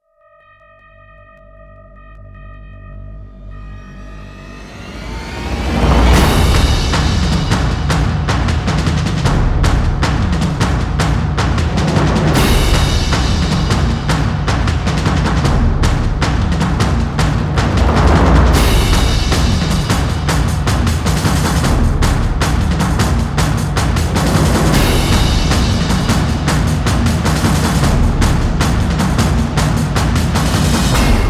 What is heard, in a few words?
drums